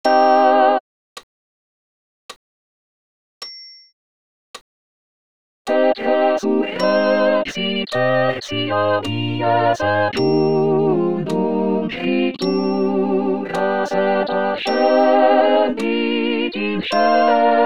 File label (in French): Credo tous voc